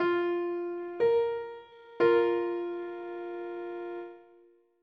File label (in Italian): Quarta giusta